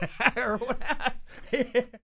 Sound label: LAUGH, Vocal Jazz Fx